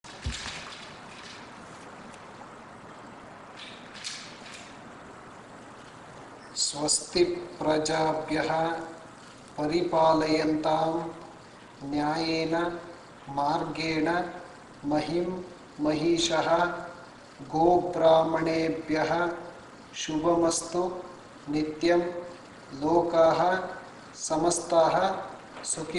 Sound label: Ashtanga Yoga Closing Prayer